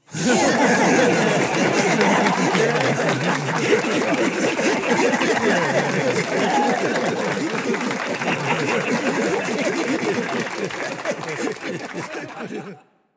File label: Crowd Laughs And Reactions; people; applause